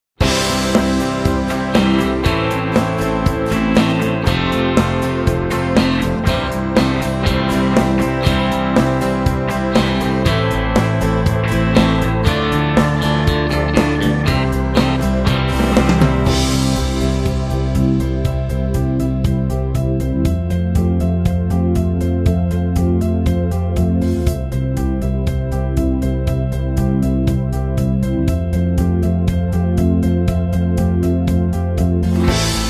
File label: No Backing Vocals, (C)